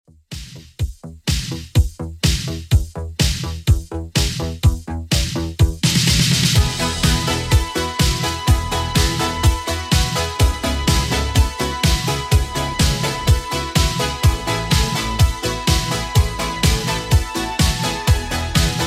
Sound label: 80s Redrum 125bpm